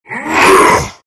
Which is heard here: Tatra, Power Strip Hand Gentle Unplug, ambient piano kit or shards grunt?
shards grunt